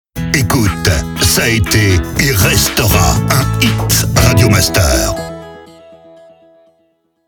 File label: Jingles MEDIUM SPEED; Ca été et restera un hit